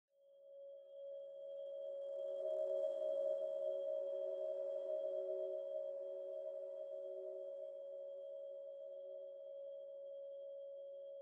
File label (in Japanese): 耳鳴り